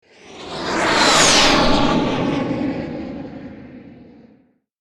Missile flyby deep sonic whoosh; artillery

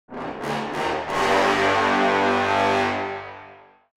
Cartoon orchestral evil

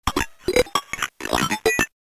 Toy robot power on

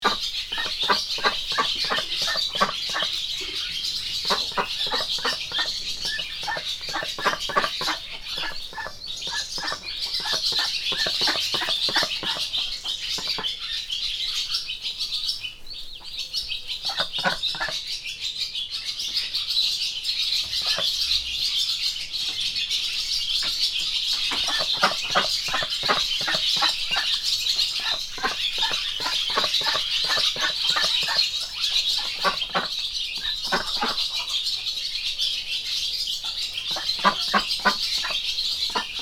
Barn interior farm ambience loop

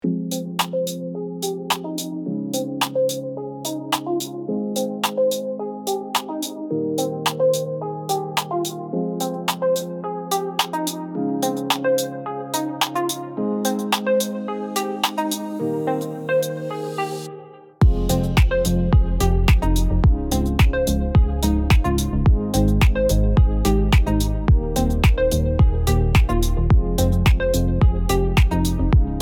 Soft pop beat

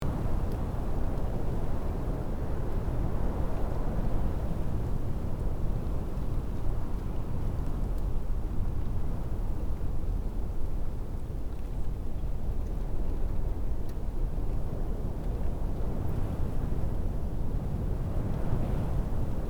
Desert soft wind ambience loop

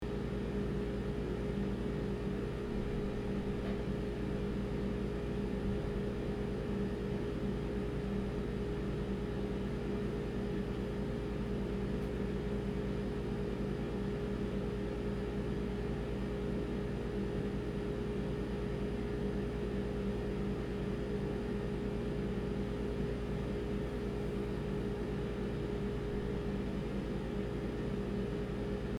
Kitchen ambience appliance hum and buzz loop

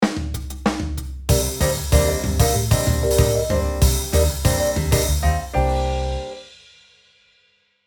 Classic ad break transition sitcom music; gfxsounds

